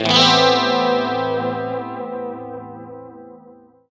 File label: Guitar Strum; Zero-G Jungle Warfare 1, 2 & 3